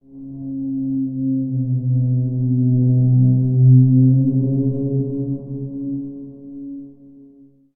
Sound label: moan, ambient outdoor